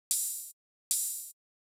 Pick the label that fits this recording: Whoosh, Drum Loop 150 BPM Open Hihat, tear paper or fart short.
Drum Loop 150 BPM Open Hihat